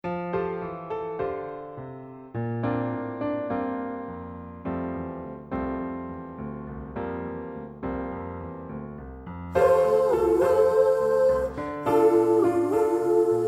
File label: VOCAL